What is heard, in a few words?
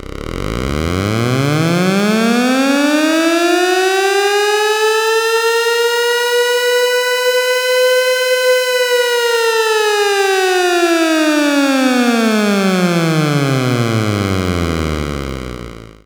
FX Alarm; Vengeance Samples